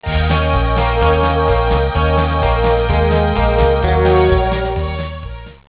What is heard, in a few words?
country